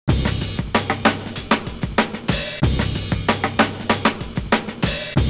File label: DJ FILL; Univers Sons